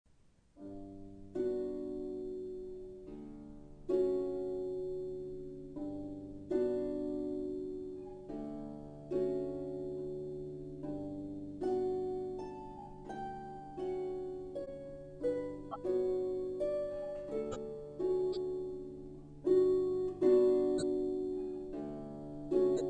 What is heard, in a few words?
Solo Harp; satie gymnopedie 1